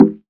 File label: Wood MPC, One Shots Drums